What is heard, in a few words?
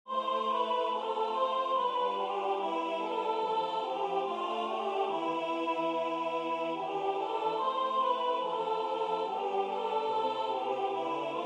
SATB; het lied van de heer in ons midden; huijbers